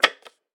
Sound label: Reports Tab Rollover